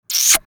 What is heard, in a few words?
Young girl lip smack